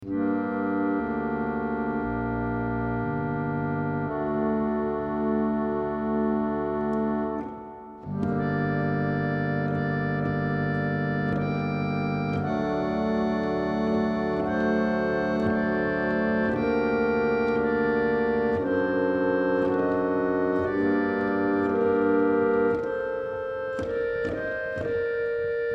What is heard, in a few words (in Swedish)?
rysk orgel; musik; interaktivhistoria